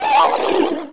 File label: scrm; The Elder Scrolls III - Morrowind